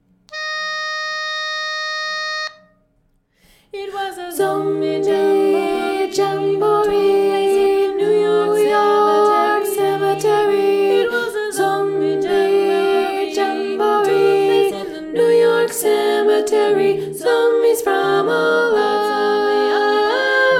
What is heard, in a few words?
tenor